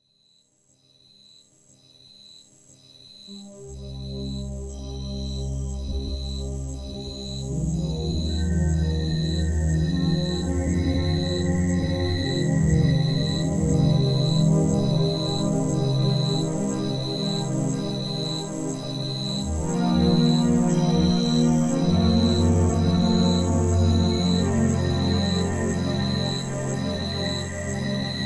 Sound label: crickets